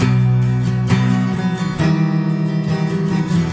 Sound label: guitar; REMIXABLE COLLECTIONS; vincents moody guitar